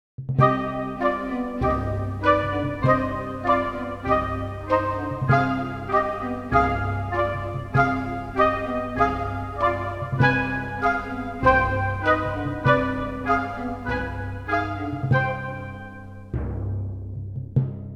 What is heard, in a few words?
Underground Parking Garage Tiefgarage; OF FATHERS AND SONS – Soundtrack